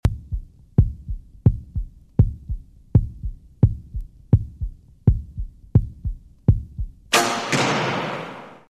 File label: Heartbeat; Rosegarden